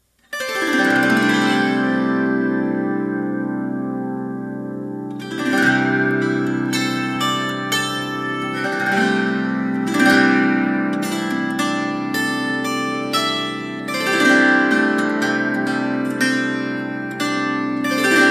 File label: Reverie plucked